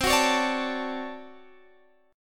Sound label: D Major 7th, C; strummed